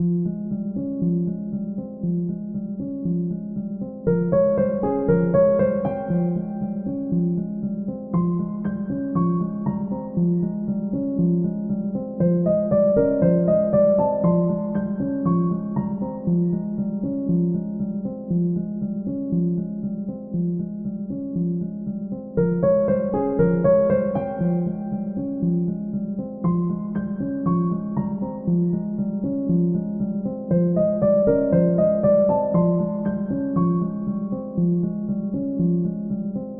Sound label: Piano Loop